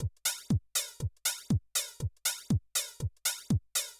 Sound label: House Drum NoSD Flanger